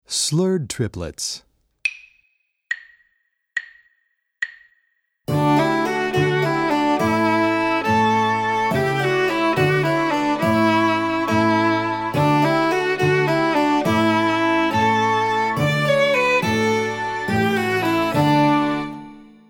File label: Slurred Triplets